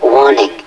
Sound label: warning